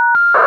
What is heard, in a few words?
close trunk